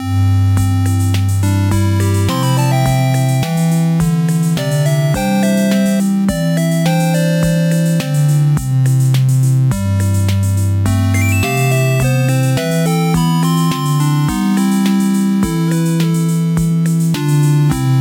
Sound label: Upbeat 8 Bit